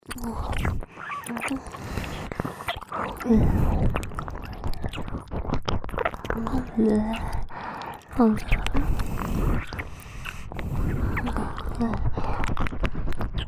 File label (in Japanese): キス＋れろれろ両耳舐め